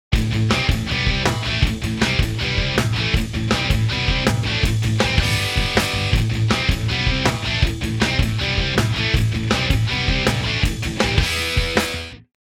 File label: METAL